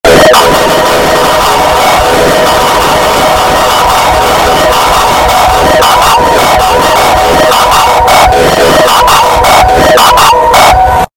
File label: android spam earrape